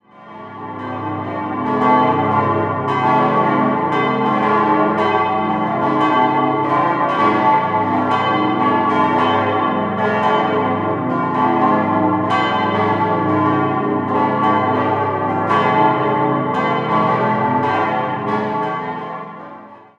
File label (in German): Elgg, Glocken